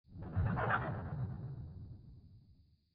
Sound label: scary fade out